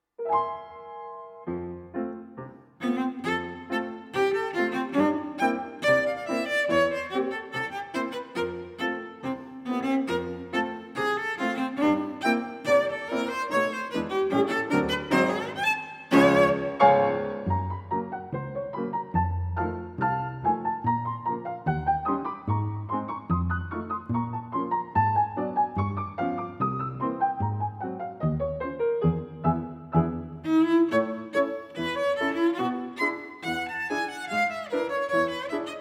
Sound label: Prelude for Cello and Piano; 24 Preludes for Cello and Piano, Op. 178 Prelude No. 17 in A